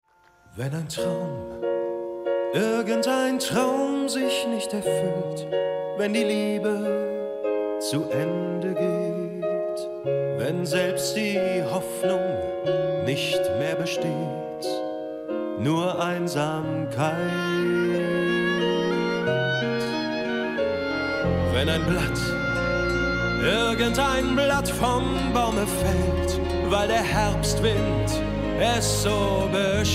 Gesang

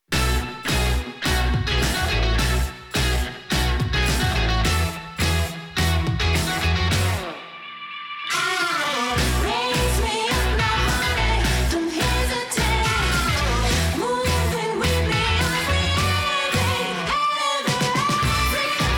Werbung Gesang

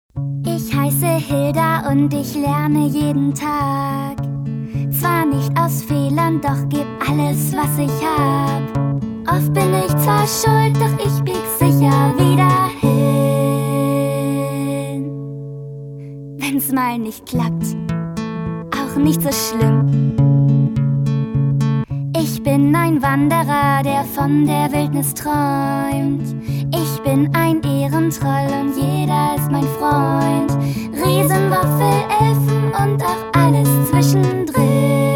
Sprachprobe singen, Vocals (Gesang), German

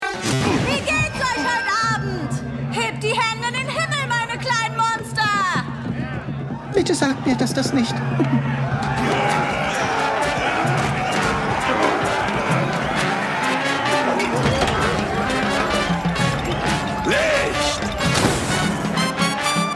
GESANG Song; Kung Fu Panda

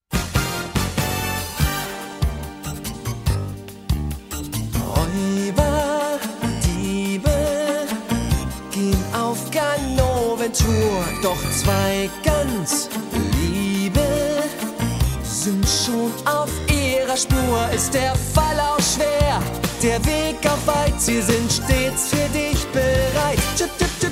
Gesang, Vocals (Gesang), English US; Chip & Chap

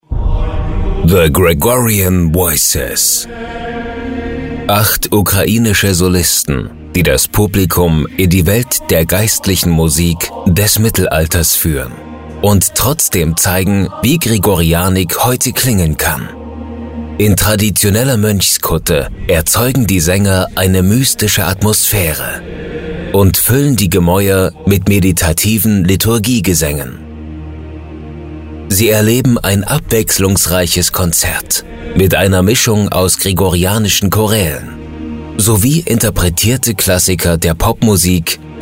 Mystisch Gregorian Voices; LOFT VOICES

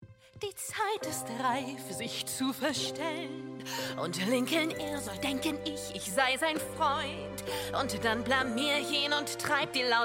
Gesang

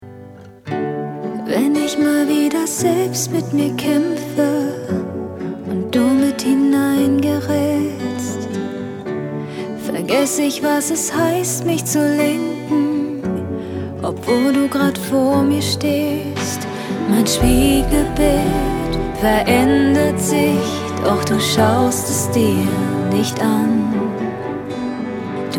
deutscher Schlager Pop tief hoch zart und stark; LOFT VOICES

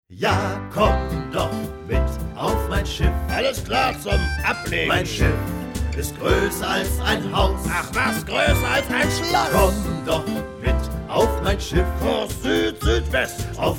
kinderlied alle gesangsstimmen; LOFT VOICES

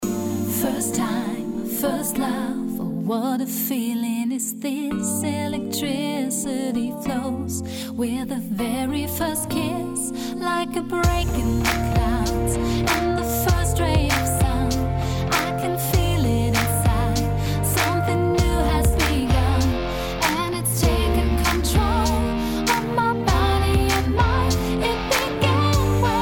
Gesang

